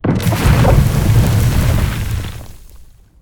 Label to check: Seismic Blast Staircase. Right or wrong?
Right